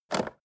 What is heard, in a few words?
car temperature knob turn short fast